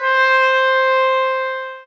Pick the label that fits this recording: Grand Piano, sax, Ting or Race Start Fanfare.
sax